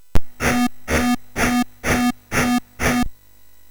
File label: Final Synth Sample, MOS6581R3; hvsc